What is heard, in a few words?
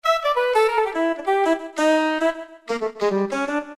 Alto Saxophone, Roland PN-D50-01